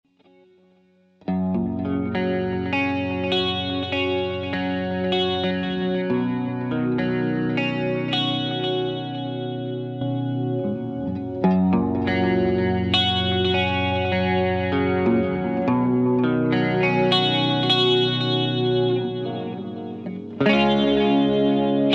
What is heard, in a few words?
Warm Audio Comp Guitar